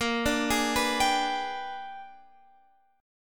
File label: Bb 13th Flat 9th; Bb13b9